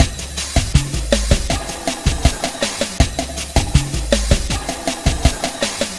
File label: TV Samba 080, Lounge Drumz